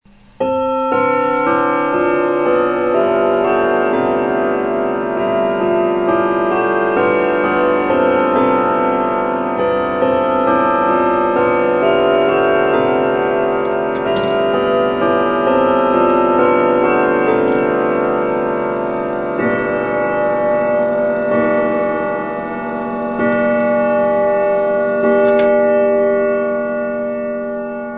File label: wittington, mechanical clock movements